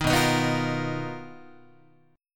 B Major Sharp 11th, Guitar, 4A,4E,5A,5D,5G sharp,6C sharp; BM#11; strummed; JGuitar